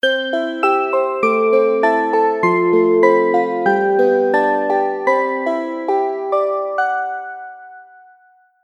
hover game over, chiptunes

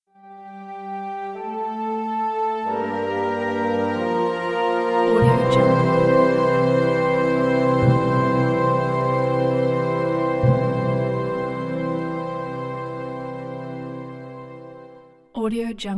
gentle orchestral swell; audiojungle

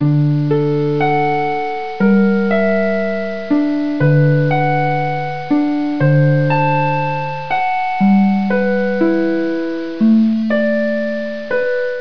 rain, chiptunes

